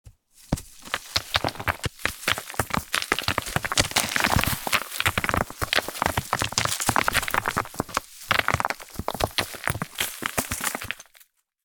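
Rock movement Stones Roll Down A Small Rocky Slope Small Rockfall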